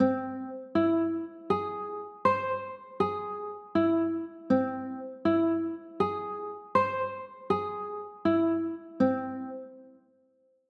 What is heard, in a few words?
c aug, chords